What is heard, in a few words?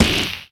Melee Magical Light; Kilta